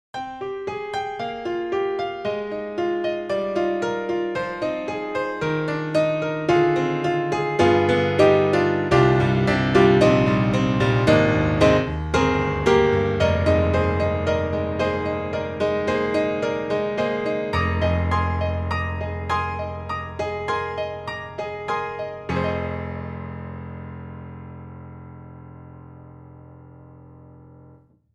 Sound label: Grand Pianos, Studio 7ft, Hard Rock C7; Synthogy; IvoryII